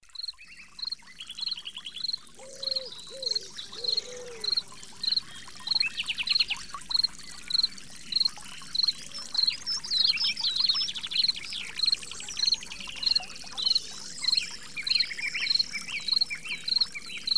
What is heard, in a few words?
Forest